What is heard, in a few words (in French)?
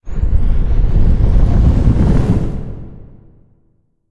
Ventil Impulsion; Puddle